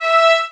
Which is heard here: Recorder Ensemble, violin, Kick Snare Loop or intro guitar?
violin